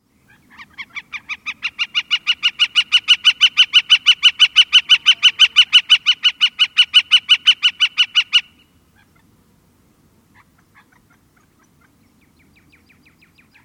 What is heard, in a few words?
Gilded Flicker